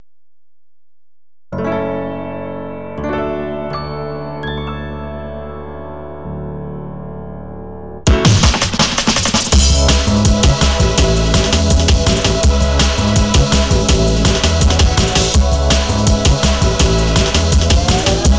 Meltdown

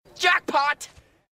Jackpot; Stiffler

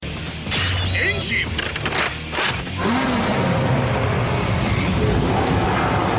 Engine

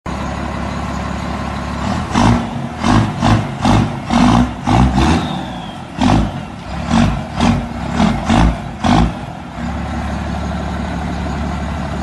Cummins 12v

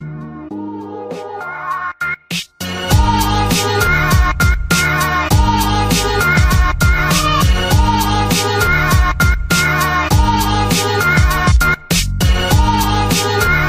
Hiphop rush

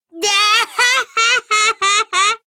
Daahahahahaha

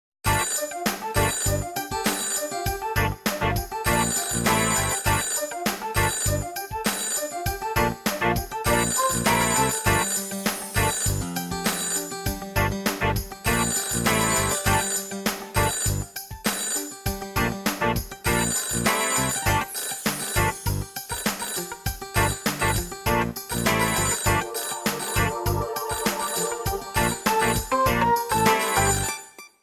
Jig; Nokia

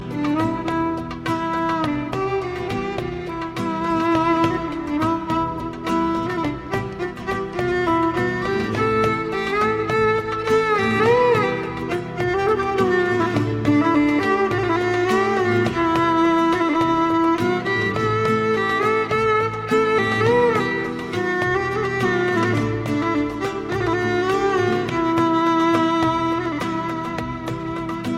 Drunk Violin

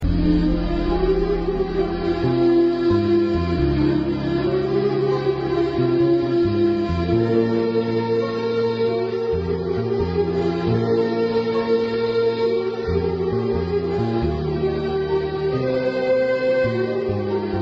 Lovely Ringtone

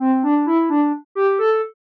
Bam

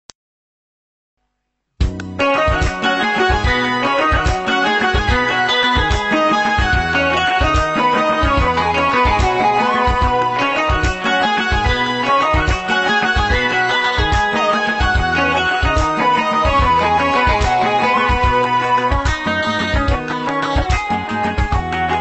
Eritrea classic

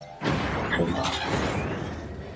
Powering On

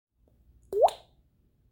Water Drop

